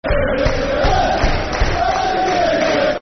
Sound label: Football Chant; Bury; fanchants-com